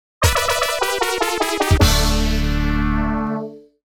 Failed Landing; Taito Retro Game Music Collection 4; Riding Hero Cluster

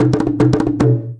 item selected

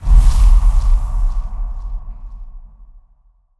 shadow create start

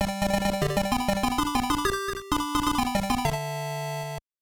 Jingle; puzzle action ichidant r; game gear 1994

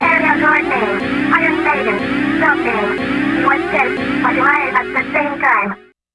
Warning

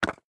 Drop Matches; Granny 3; Android

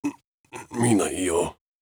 in Pain

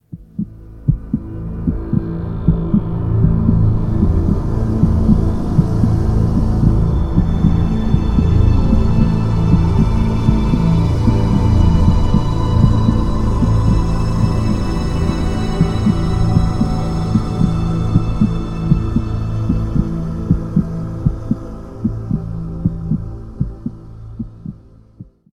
Medical Center; City of Villains soundtrack; Steel Canyon Steel Canyon Medical Center

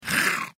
Sound Monster Die

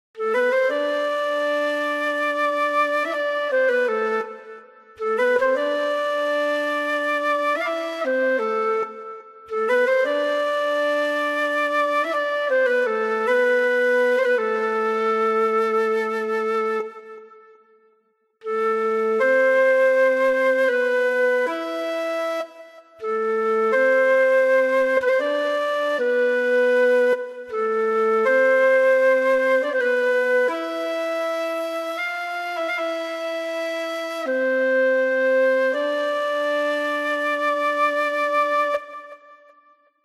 Village Song Flute

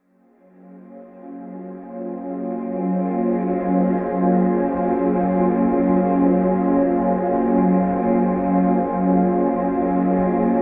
Ambience; Koi de wa Naku

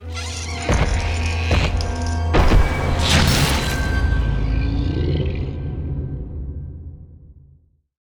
Boot Sound; Bit Dungeon Plus; Wii U

